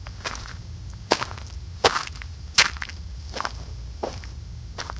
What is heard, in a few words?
heavy confident footsteps, gravel